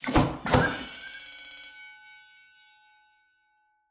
epic Win Text Change; cashomatic

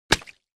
lucky mug fall down; finn

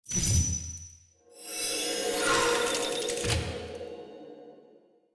key Inserted; turn your fortune

